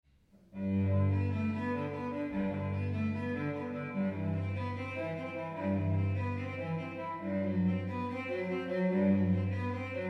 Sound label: Solo Cello; Prelude in G; Bach